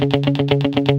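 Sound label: GUI 120, guitar; Best Service Gigapack 2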